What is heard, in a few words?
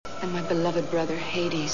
celesta